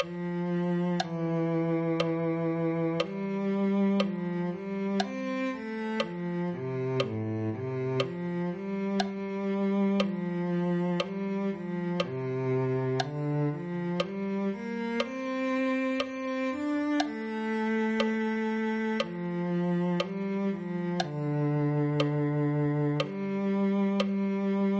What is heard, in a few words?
cello